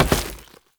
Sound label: Step Goo, Foley; Warframe; Oraxia